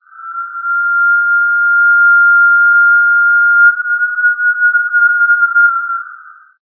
inhambu relogio

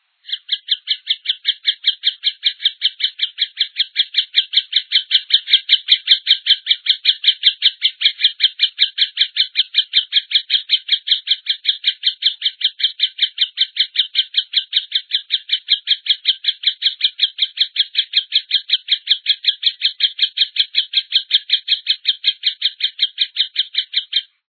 tapaculo-preto

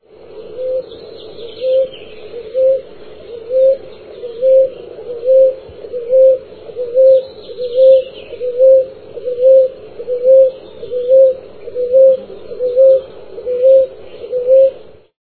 rolinha

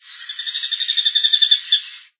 pica-pauzinho-avermelhado

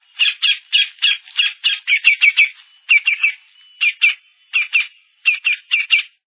pica-pau-do-campo

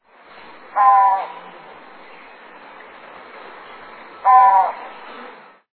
udu de bico largo

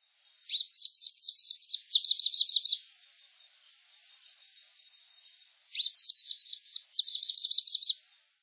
balanca-rabo-de-chapeu-preto

